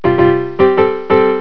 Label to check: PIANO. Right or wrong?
Right